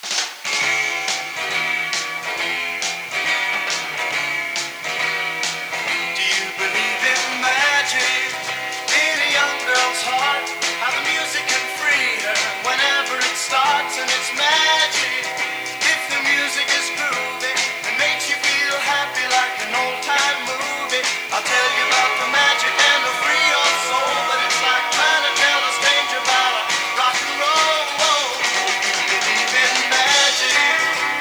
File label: Beatophone Loop C; tf2classic